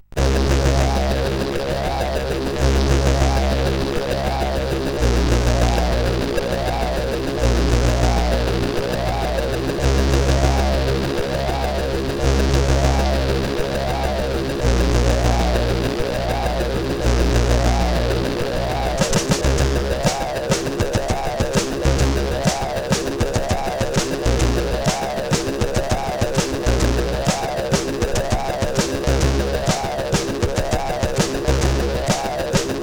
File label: Heloport, CSG8580R5; hvsc